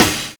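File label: MUTE CRASH; Univers Sons - Kitch 70s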